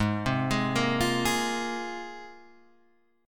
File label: G sharp 6th Add 9th G sharp Guitar Standard; 4,3,6,3,6,4; arpeggiated; capo=3